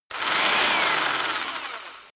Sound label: rock drill rev high medium; maschinen; bohrer; soundpool